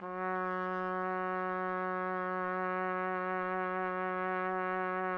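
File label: trumpet; Roland - LA Composer vol. 3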